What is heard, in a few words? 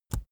keyboard laptop enter push soft; kommunikation; computer